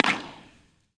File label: Library, Impulse Response; Geisel; EchoThief Impulse Response Library